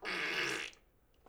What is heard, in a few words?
mouth fart; rutti e scoregge